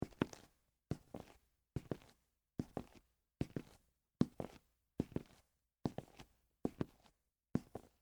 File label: Dress Shoes Walk, Concrete; Footsteps; Human; BlastWave